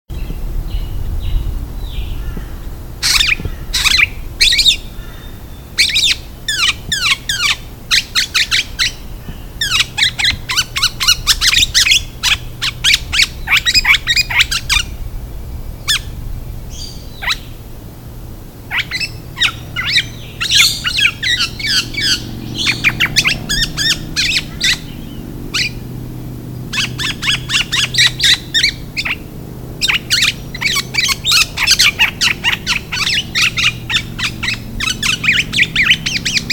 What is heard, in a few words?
Oriolus Sagittatus; Olive-Backed Oriole